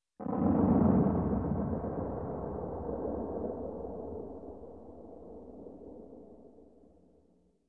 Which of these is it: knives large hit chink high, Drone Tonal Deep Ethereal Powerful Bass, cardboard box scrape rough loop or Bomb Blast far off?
Bomb Blast far off